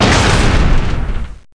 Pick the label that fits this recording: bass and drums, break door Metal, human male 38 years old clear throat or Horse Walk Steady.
break door Metal